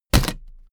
suitcase hit empty case

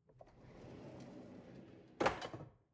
drawer narrow close long

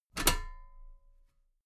metal ladder step regular clank

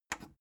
plastic button small simple click fast

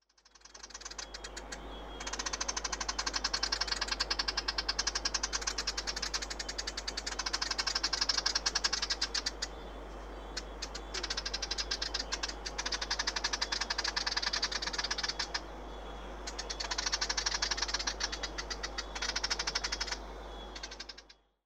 rain forest birds

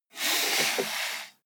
computer mouse draw long

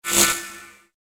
electric sound

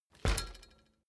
bicycle street bump front short

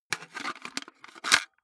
cassette into walkman

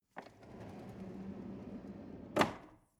drawer medium close draw

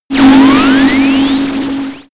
high alien fuse

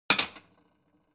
plate small shelve wiggle

